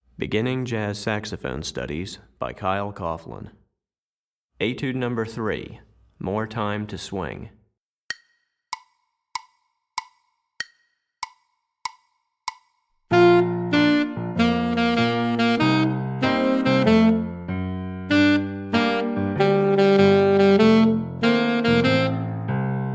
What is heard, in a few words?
Alto Etude, Saxophone; Beginning Jazz Saxophone Studies Alto V1